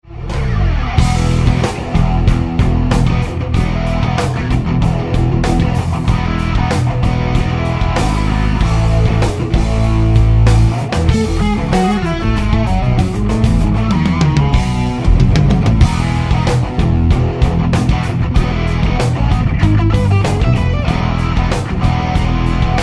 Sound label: Purple Guitar